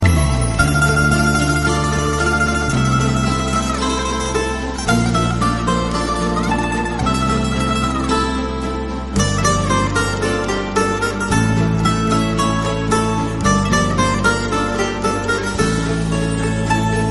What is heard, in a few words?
Polka